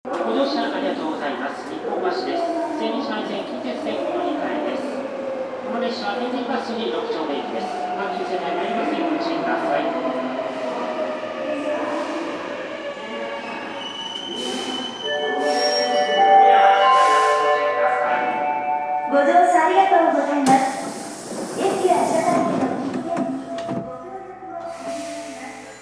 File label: hankyu 8315; vvvf; toyo denki